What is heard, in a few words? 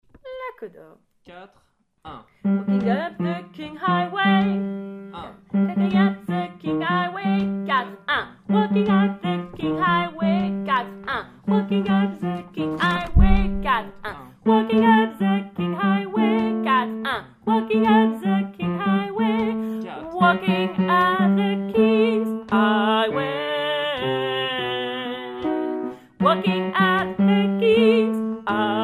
coda basse